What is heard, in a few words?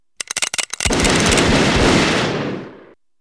multiple shots